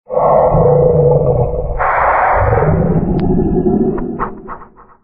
headshot; vapesquared

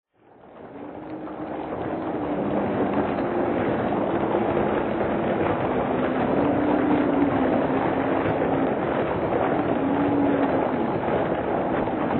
applause; vapesquared

